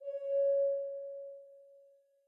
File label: air